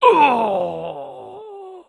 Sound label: Player Death; AudioWizards